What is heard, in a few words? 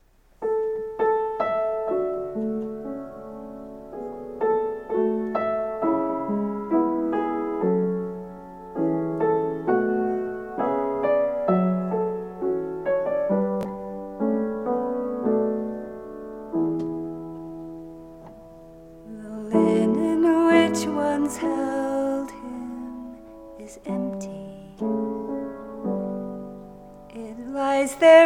vocal solo